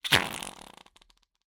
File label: cartoon wet squelch fart